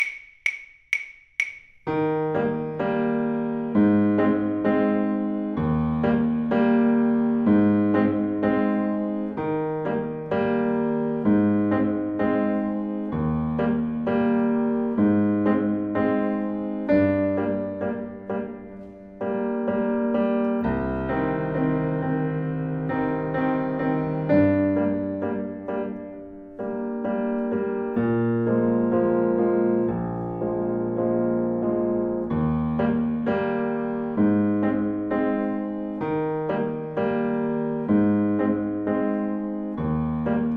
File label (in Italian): Saxo Tempo